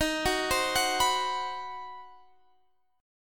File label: C Minor Major 7th Flat 5th D sharp, chord